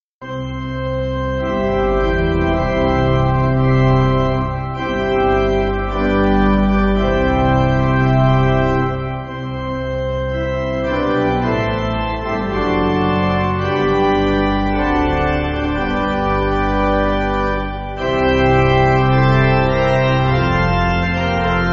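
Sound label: Pipe Organ, church backing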